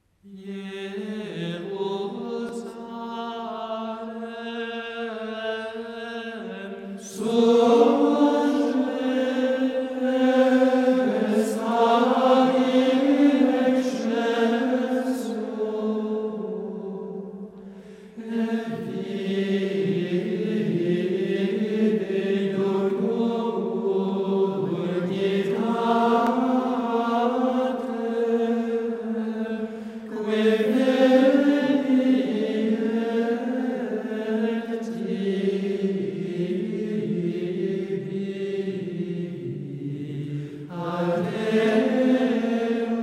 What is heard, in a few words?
Comm. Jerusalem surge